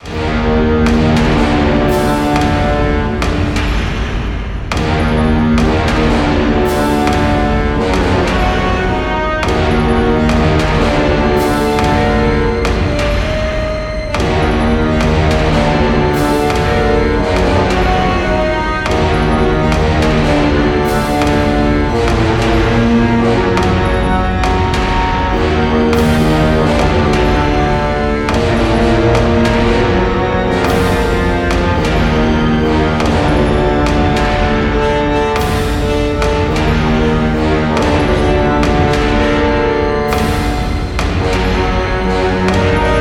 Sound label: Dark Battle